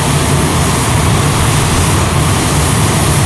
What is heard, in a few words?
wind suck, combine gunship; hl2